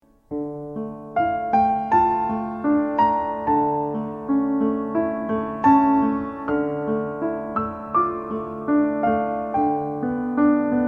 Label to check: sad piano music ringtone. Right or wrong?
Right